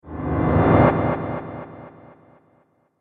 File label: Horror Dark Piano, Piano Sound Effects; musical